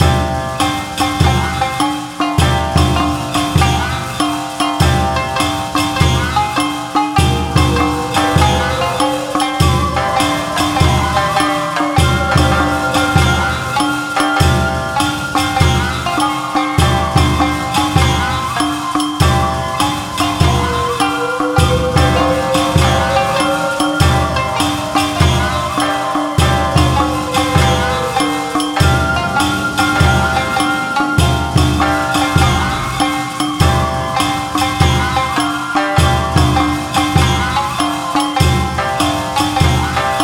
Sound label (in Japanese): 和風ホラーBGM; 百鬼の宴; Monster Banquet; 戦慄の恐怖BGM